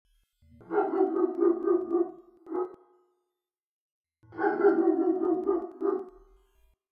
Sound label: big dog bark distant